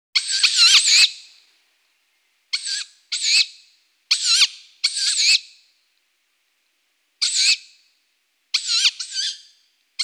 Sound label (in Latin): Strix aluco hlas mláďat